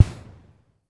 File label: Snare Reverb, Vermona DRM1-MK2